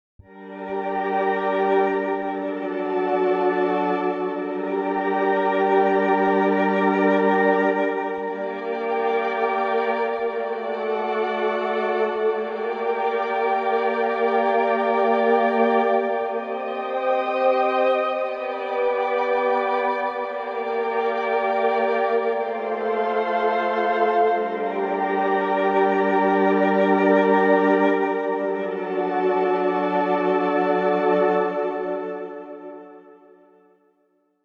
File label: Chamberlin Strings; gforce software